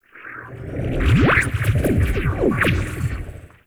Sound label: Ship Laser Whip; Warframe; Corpus Ship Remaster